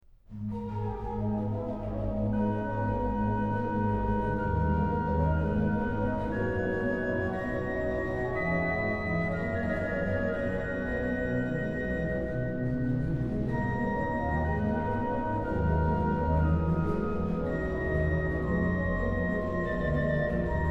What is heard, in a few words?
Herr Gott, nun schleuss den Himmel auf, organ; BWV0617; BACH; BACH ORGAN WORKS; CHORALE PRELUDES